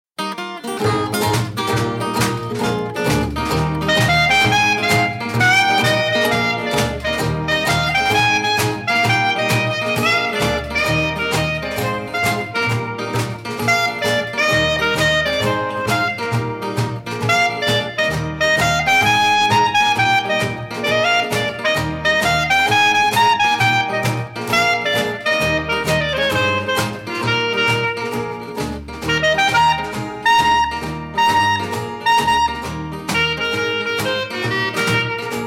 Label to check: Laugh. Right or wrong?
Wrong